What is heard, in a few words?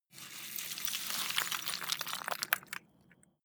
stone small landslide short